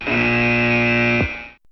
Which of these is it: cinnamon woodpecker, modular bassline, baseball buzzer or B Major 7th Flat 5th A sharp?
baseball buzzer